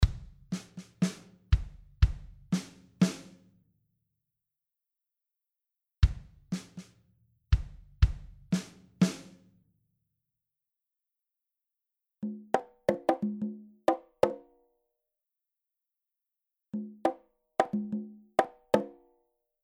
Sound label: Rhythic motifs, notes omitted